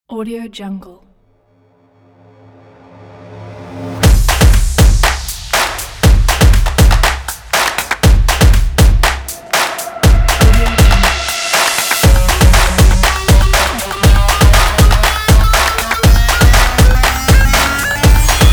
drums upbeat logo

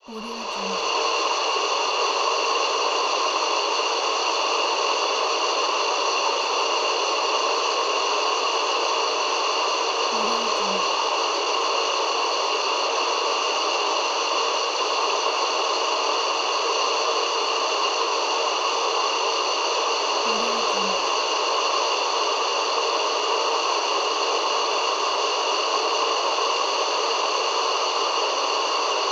the gas

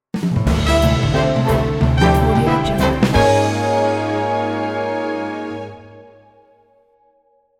jazzy sitcom stinger transition

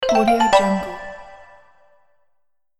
xylophone and mallet notification

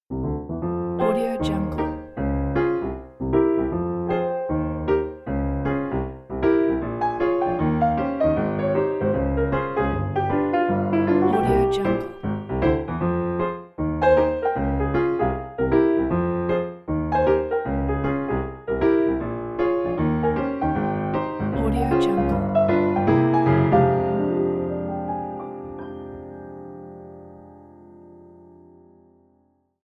piano jazz; ident

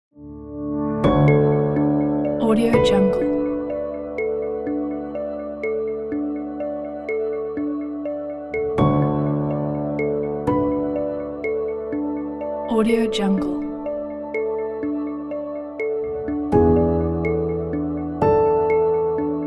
cinematic underscore

